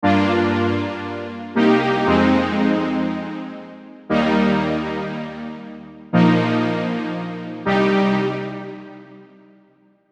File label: Ionian Prog, Scales; Unison Ionian Advanced Scales MIDI Collection